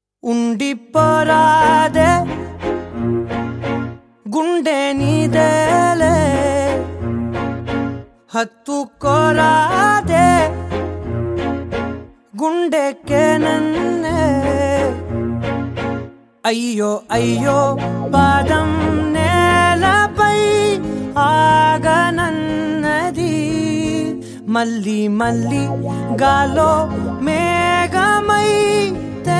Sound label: telugu hit song